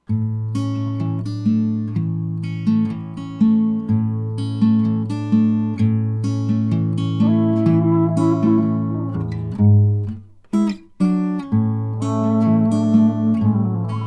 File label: Acoustic Electric